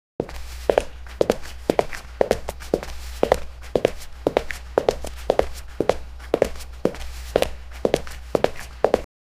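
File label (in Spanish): pasos corriendo acercándose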